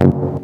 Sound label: Acid Sounds F#, Synths; Vengeance Samples